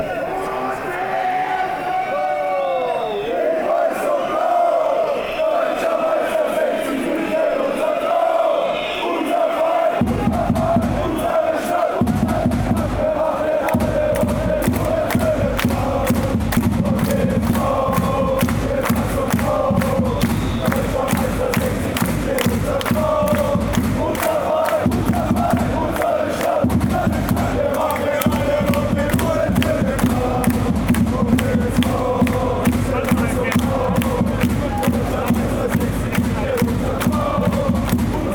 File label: give us goal